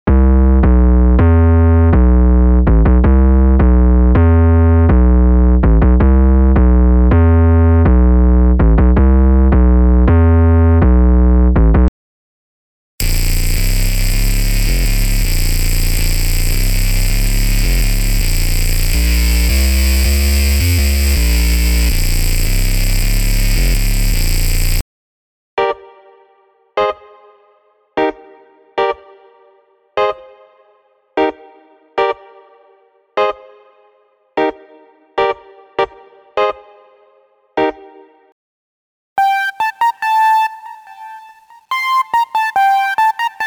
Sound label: Trap; unisynth